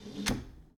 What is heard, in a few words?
Foosball Forward Slide